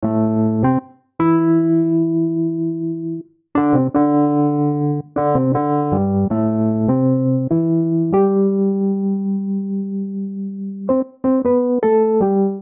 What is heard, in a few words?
Phrase Dub A Minor 76 bpm, Electric Keyboards; Ableton; Factory Packs